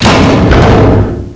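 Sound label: buzzer door kicked; svencoop; sence; mistake coop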